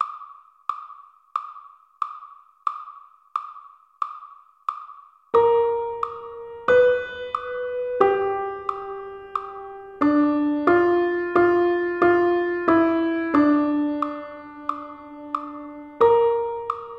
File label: blues pf1 bpm90; Dog-Gone